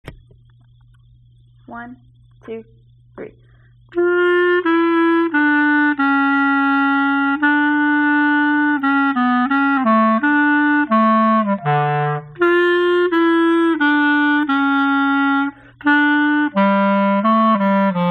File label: menuett clarinet part